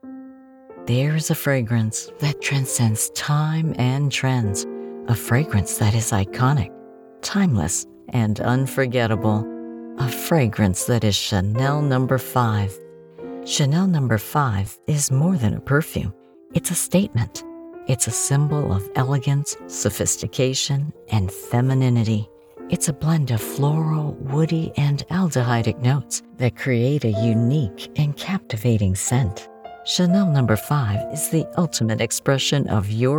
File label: Music; Chanel No 5